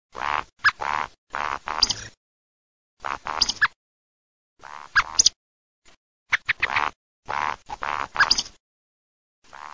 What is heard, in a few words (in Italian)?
BECCAMERLO CHIO